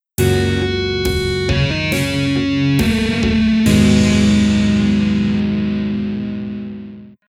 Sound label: jingle